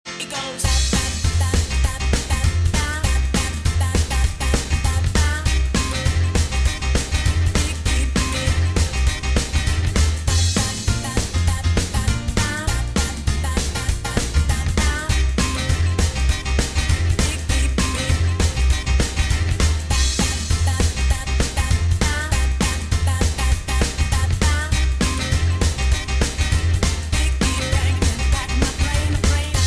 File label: big beat